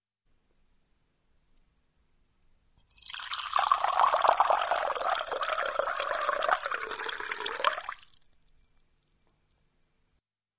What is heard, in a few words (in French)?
Verre, Un verre d'eau